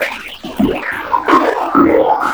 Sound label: FX LOOP, Big Beat; Big Beat Samples & More; AKAI; ALL LOOP KIT